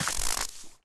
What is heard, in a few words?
snow footsteps; zps; Foley